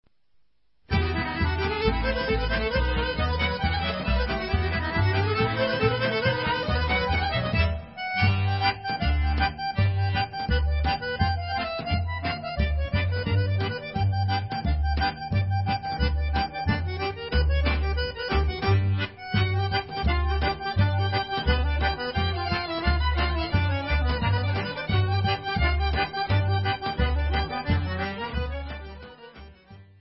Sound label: Horo Bulgarian